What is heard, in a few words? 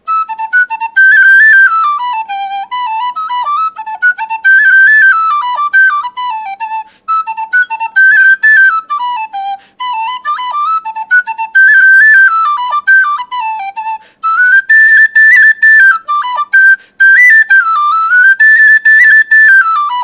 oriordan mug, oriordan soprano c d ebonite tube